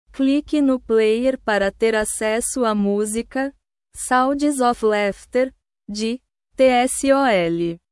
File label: sounds of laughter